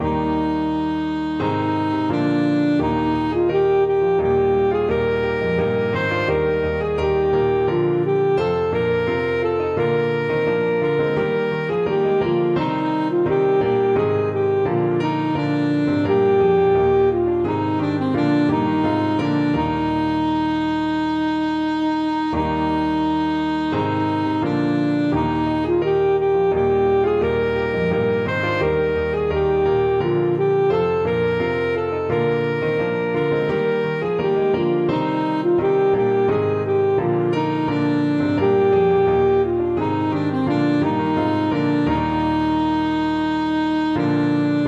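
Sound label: alto saxophone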